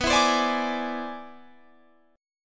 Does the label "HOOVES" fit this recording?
No